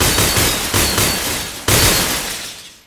Ice Boom Ice; Nix skill; MUDevs S20.1-3

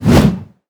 Devil Sword attack; Karda Mahal Underground Temple

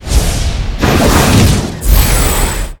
Giantswing; Ragefighter

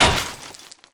Mine Attack; Send Mine; MUDevs S20.1-3

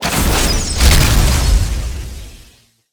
Raining Arrow; MUDevs S20.1-3

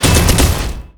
Raining Arrow Hit; Elf

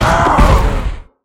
death; GaionKalein

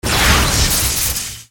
summoner skill chainlightning; MUDevs S20.1-3

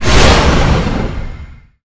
Swamp mon summons; Swamp Of Darkness

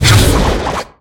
attack; Deasuler

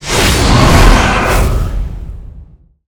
Attack Wheelwind; Ferea; King

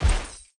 move; Jelint

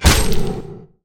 salamander hit; Akeron

